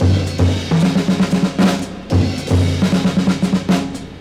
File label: 114 bpm breakbeat sample f sharp key, Free drum loop - kick tuned to the F# note.